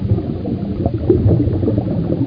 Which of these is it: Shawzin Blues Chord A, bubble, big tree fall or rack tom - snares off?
bubble